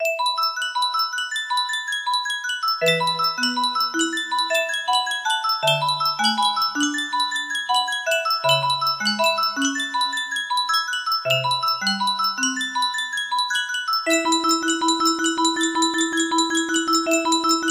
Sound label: nes music box, music box melody; ducktales